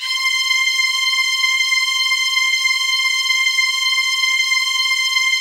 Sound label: HIGH STR, House Dance; 90 s Sample CDs; Best Service Dance Mega